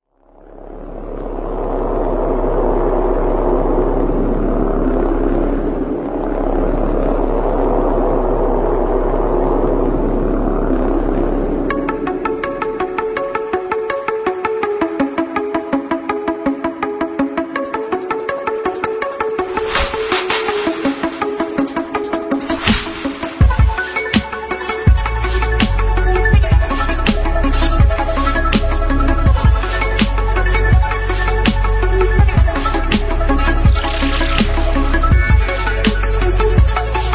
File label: sad emotional piano choir rap instrumental